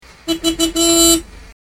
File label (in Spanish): bocina moto